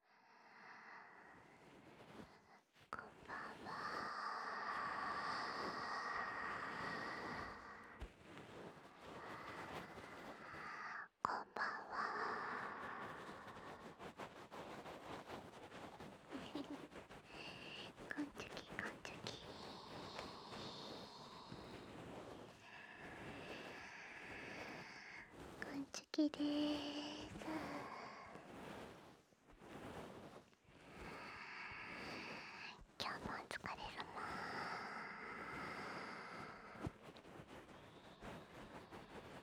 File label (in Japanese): マッサージ＆ドキドキ心音＆耳舐め, ASMR